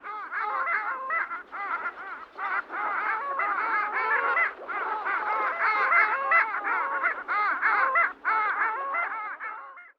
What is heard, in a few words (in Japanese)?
koorigamo